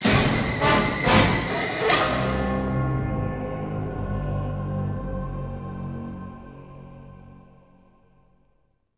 Combat Lose; Combat Reg4 Lose

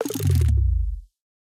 App Exhaust

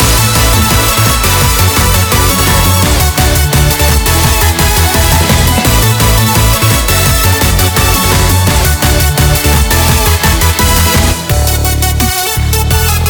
BGM Reach

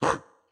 Chop Attack; Caffiend Caelan

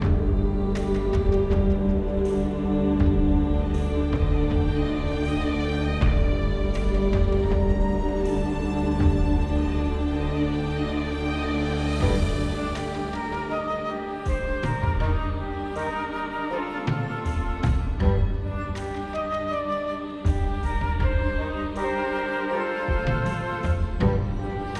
Dark Forest

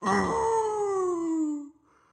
Pain Crazy; Going Under; Caffiend Caelan

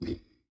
Hurt; Black Hat; Going Under

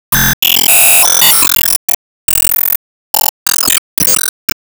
Ingame Result Rank 1st

